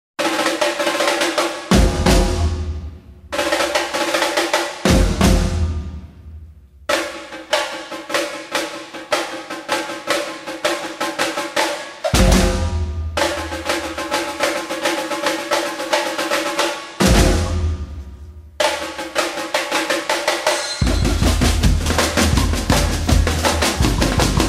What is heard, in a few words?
Samba Reggae